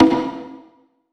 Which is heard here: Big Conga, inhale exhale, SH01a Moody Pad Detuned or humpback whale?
Big Conga